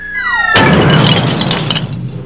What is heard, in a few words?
down beeyoop crash